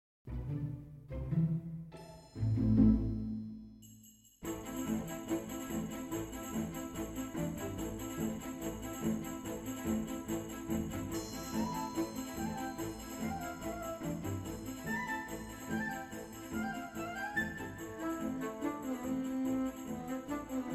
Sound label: Ballet Coda Allegro; Winters Passing - Ballet in One Act Op 1